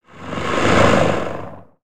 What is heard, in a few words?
Idle Breath, creatures organic